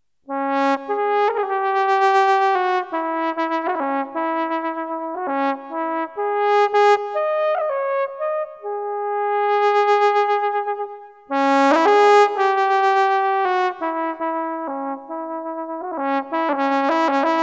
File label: Bright Tbn